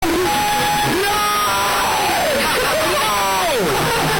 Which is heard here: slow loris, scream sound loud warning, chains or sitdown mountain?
scream sound loud warning